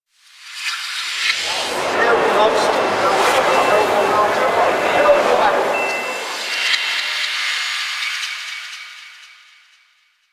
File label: Ghost Cargo Echoes; Ambience; Warframe; The New War; Quests; Zariman